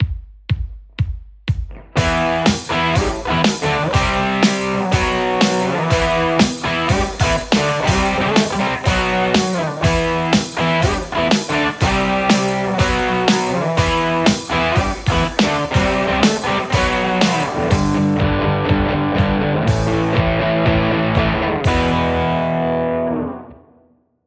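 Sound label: Telecaster; acme metalc15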